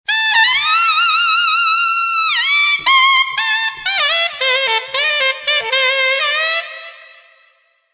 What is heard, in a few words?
plate delay, sax